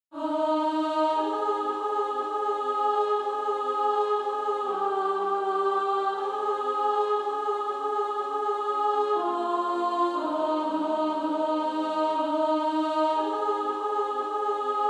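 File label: Female Choir, soprano 2; On Christ the Solid Rock I Stand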